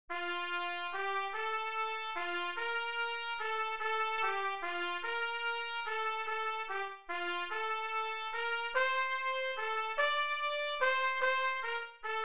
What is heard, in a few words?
error detection